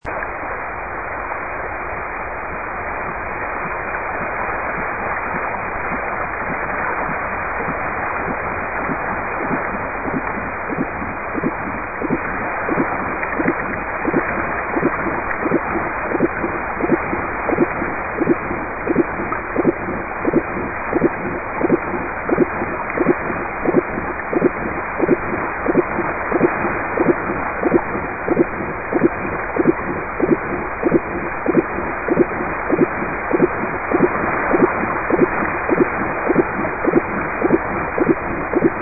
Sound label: minke